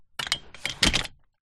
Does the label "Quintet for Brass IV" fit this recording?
No